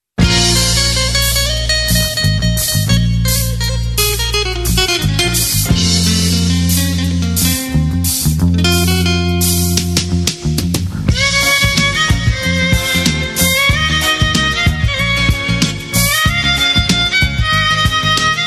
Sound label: Violin Guitar Ringtone